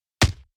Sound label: Hit with Club